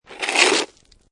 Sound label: Snow And Ice Ice Scrape Slide; Weather